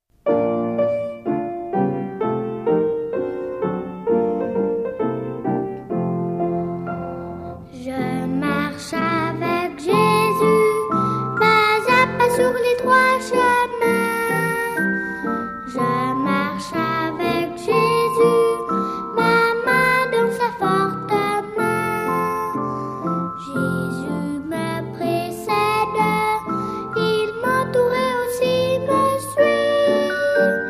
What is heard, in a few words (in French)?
marche, cantiques